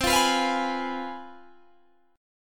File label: C Major 7th Suspended 4th Sharp 5th, C, down, Guitar, Open G